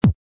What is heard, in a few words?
kick